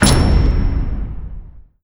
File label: replay dn complete, ui menu